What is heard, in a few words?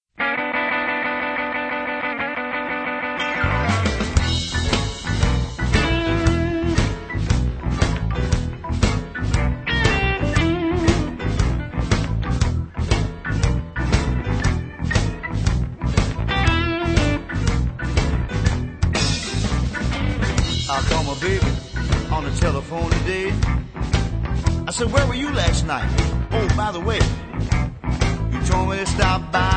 Burnin Blues